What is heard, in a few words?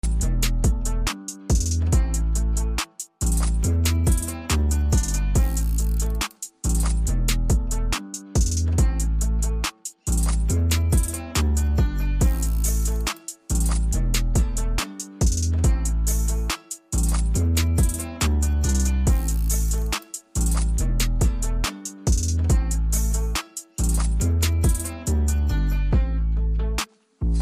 Trap beat in country